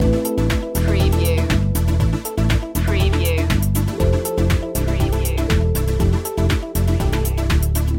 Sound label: uploading loop